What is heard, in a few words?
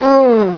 pain; wanted